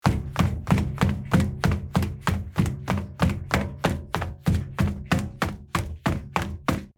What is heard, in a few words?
Footsteps on metal ship deck running